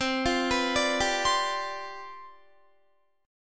C Major 7th Suspended 2nd Suspended 4th C down Guitar, chord; CM7sus2sus4 arpeggiated